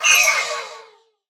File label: allay item taken; mob; minecraft